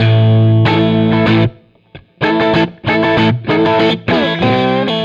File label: Electric Guitar, Loops; Guitars